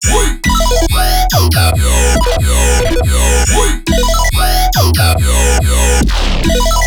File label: Melodic Bass Loop E 140; Loops; Phobos; Ghosthack - Color Bass Essentials